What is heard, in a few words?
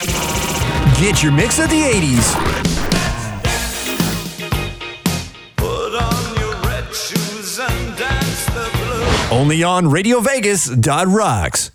Sweeper Mix 80's; RVR